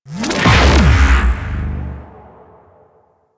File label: Ship Laser Canon Fire; Warframe; Ambience; Grineer Railjack POIs; Grineer Super Weapon Ship